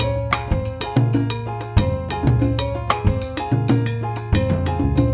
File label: son montuno